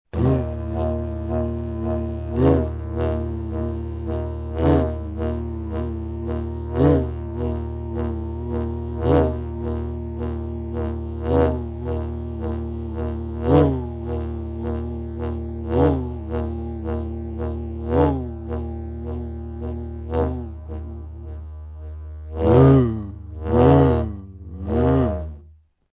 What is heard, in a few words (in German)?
train bauchstoss, didgeridoo